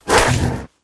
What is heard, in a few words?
Rat wound